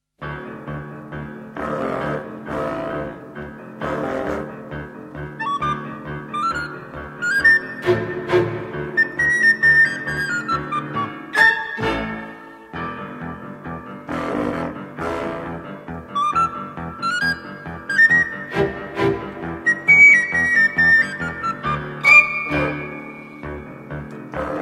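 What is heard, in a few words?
ingame loop, video game soundtrack; escape from frankensteins tower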